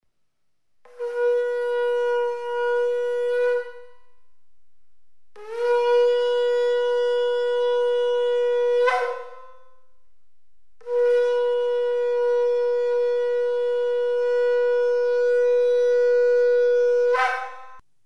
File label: shofar; pro-worhip